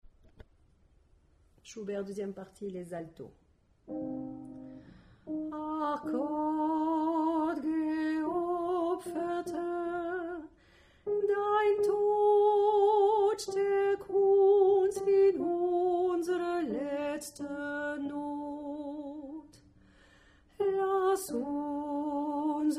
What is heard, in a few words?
Alto; schubert